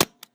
FX

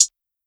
HI HAT, Closed Hats; BWB X SUPA 2

